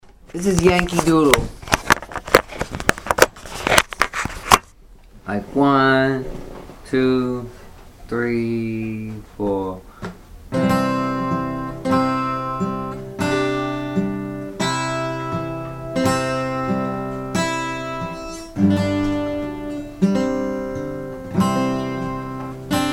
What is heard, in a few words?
reading playalongs yankee doodle